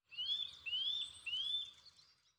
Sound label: nuthatch, Night; FontainebleauDemo